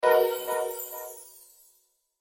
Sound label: gamble Lose; slots; House Of Luck